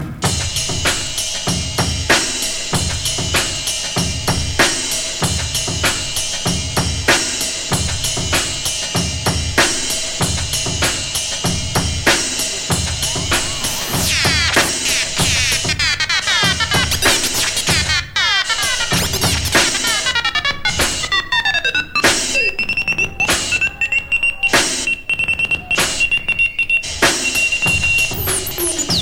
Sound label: scratching freestyle